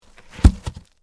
Impact Wood Panel, Drop, In, Snow; Wood (Sound Effects:Materials); BlastWave

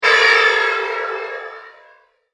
Metal Fantasy Impact; fantasy sound effect

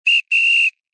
Sports Referee Whistle Blow Multiple; Whistles; Objects

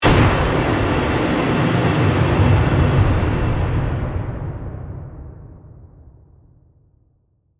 Hit To Metal; Hit Sound Effects; Sound Design; BlastWave

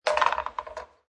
Billiard Pool Snooker Ball Falls In Pocket; Pool ball, snooker ball, billiards ball; Sports; LynneMusic

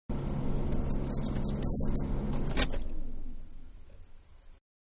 Foreign Car Ignition Off Interior; Cars (Sound Effects:Vehicles); Car Foreign-Ignition Off-Interior; Manhattan Production Music

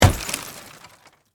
Impact Automobile Window, Shatter; Crash Sound Effects; Crashes; Objects

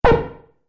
Multimedia Button

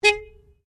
HYUNDAI ACCENT 2008 HORN SHORT EXTERIOR; Hyundai hire car; Cars; Vehicles

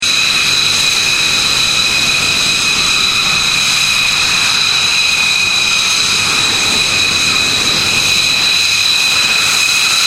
F16 Falcon idle very close warning loud

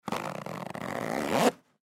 Duffle Bag Empty Zipper Zip Up; Zippers; Objects; Blastwave; Foley

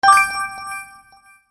Fairy Harp Accent, cartoon; Cartoon Sound Design

